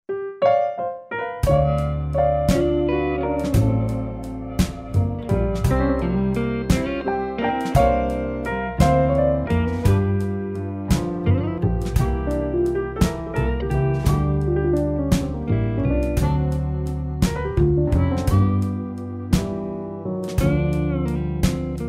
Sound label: blues